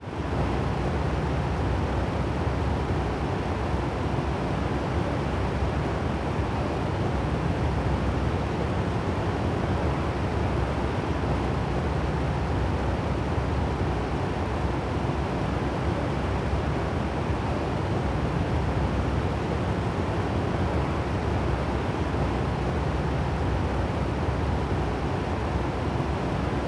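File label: ambient air; Wreckless The Yakuza Missions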